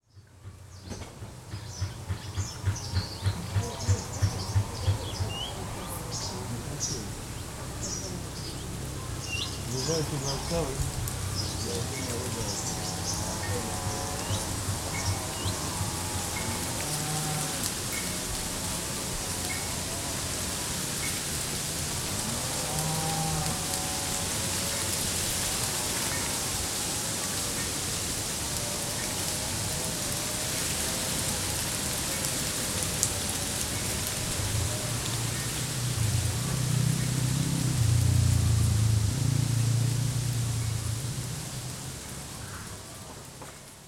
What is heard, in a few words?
main street morning; sharapovo